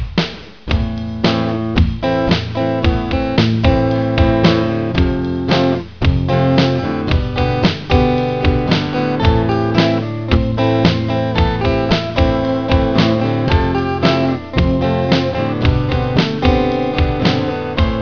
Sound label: lead, guitar